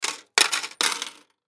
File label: Cash Box Drop Coins, Cash Box Drop Coins Inside; Money; Objects; Blastwave